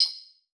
Transition Hit Impact Ping; Production Element

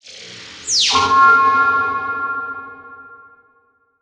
Transition Laser Horn; Production Element

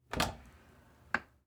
Household Cabinet Open Door

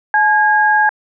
dtmf tone C

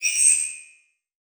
Flash Animation Short

